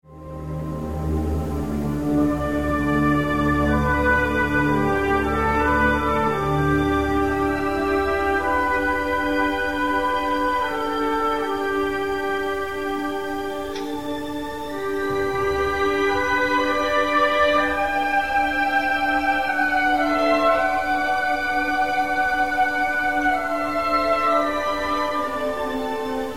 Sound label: off stage solo, trumpets